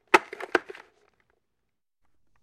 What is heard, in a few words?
Football Helmet Pla, football